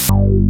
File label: TRANS X BASS, Synthsonic; Pro-Rec - Synthsonic; BASSES